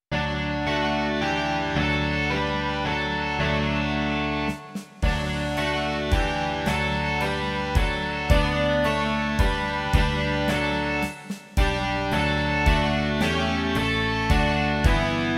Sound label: rock